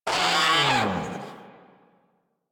Catbrow Combat Vocal Death; Warframe

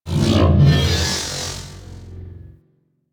UI Augment Reality Start; DE; Warframe; Railjack Player Ship

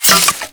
Reload; Kuva Zarr; Cannon

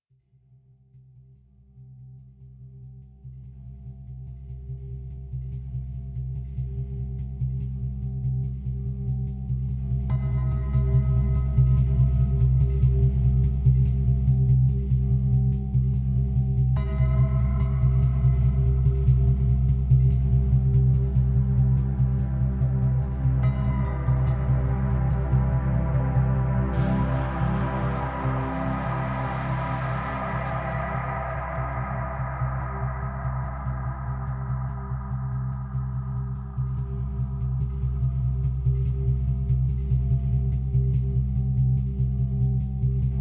Hub Cue, Music; Warframe; DE; Hubs; Zariman

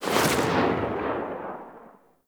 Infested Spore Pistol Tail; Weapons

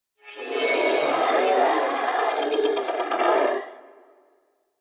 Sentient Symbilyst Idle; Enemies; Barks

